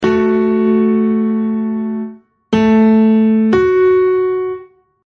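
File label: major sixth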